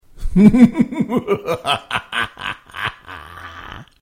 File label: Evil laugh